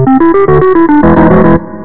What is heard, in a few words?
lose life; jumping jack